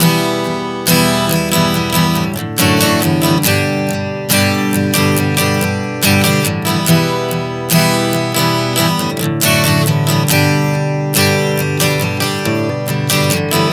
Progression 070 Em-D-G, Acoustic Guitar, Chord Progressions 070 bpm; Sonic Foundry; Songwriter Acoustic Guitar Companion